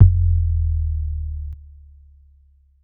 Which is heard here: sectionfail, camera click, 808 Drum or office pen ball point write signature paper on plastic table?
808 Drum